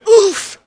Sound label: UUMPH; Messiah